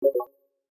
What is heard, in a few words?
Space slide close; Nokia; Audio themes